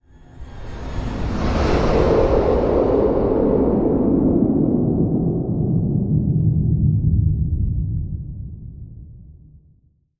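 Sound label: Whoosh Death Row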